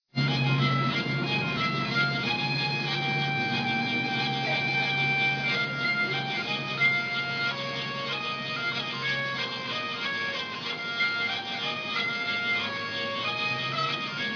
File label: hurdy gurdy; portsmouth